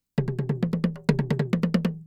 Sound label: Toy Djembe Toms, Asia; Sonic Foundry (Sony Creative Software); Continental Drift Aftershocks